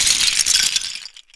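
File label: debris large; LEGO Star Wars Force Awakens; Junkbot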